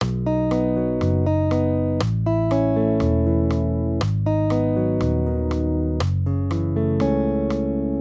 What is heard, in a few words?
maj triads